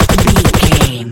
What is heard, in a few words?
gun semi auto shot silenced